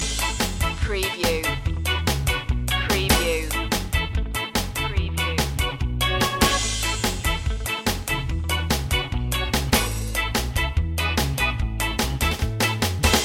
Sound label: ska mad loop